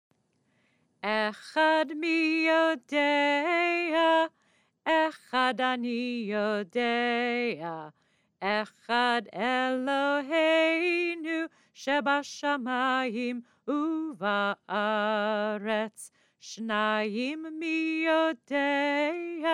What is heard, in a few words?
Echad Mi Yodea Quicker tune